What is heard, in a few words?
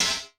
Perc Reso, Vermona Mono15 Drums